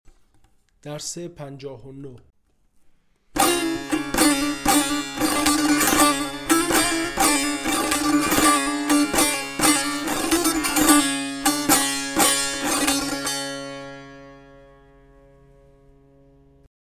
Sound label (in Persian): مشق تنبور